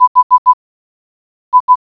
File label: Hi In Morse Code